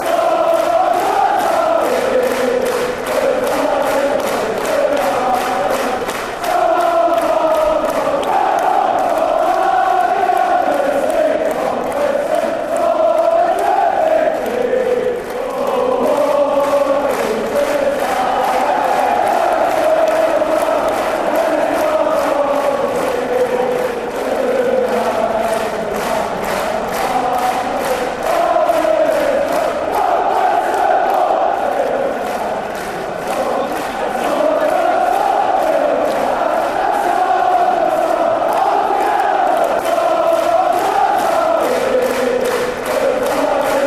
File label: irish national anthem fanchants